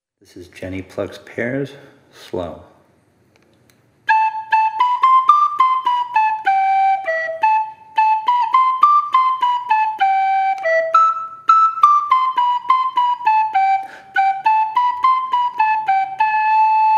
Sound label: Slow; Jenny Pluck Pears